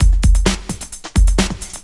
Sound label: drt 130 electro, DRUMLOOPS, LOOP ELECTRO